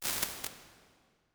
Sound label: Echo Plate, Lexicon 480L Reverb impulse response; Big Gee's